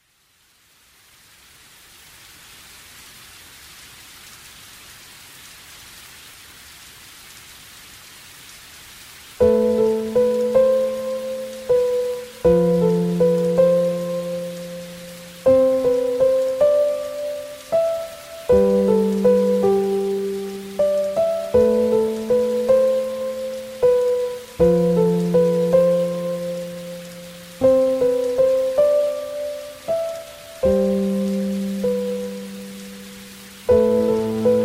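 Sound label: melody; muzyka vesny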